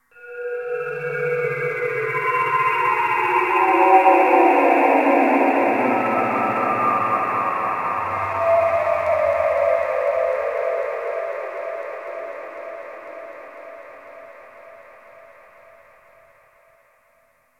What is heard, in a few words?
Sector Wars Rand Amb, Ambience; Warframe; Tenno